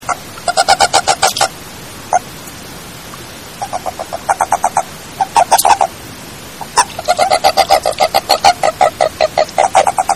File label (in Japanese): kaeru